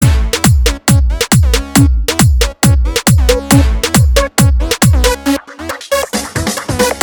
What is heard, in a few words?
Ring Tone